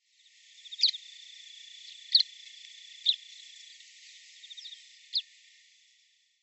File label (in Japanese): tonen, hyogo